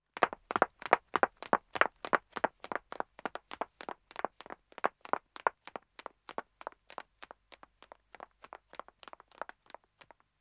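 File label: Wood (Dead Acc) Running 2 women; Footsteps; BBC Sound Effects Library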